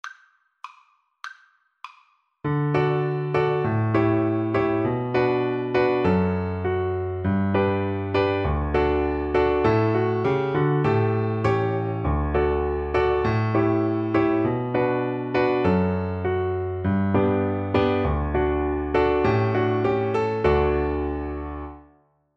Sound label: Jolly Old Saint Nicholas for Viola